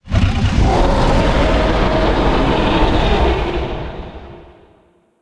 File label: Ice Titan sound